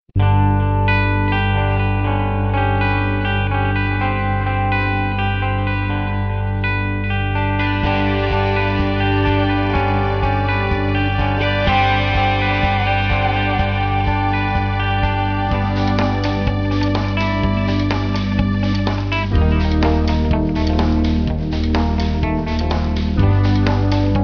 Driving Guitar

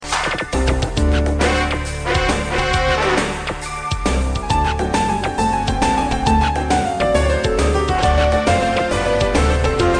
Cool Music

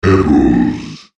Pebbles

